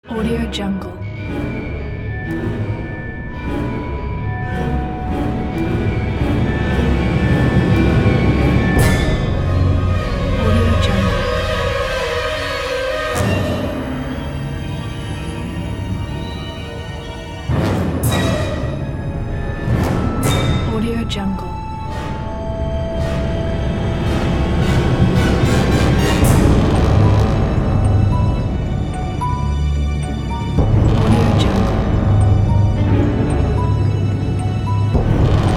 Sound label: classic atonal cinematic horror terror